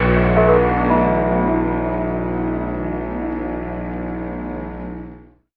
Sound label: oceanwah, stabs